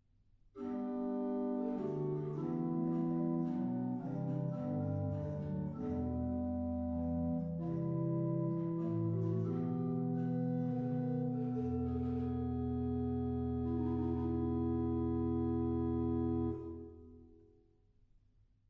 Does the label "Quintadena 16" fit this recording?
Yes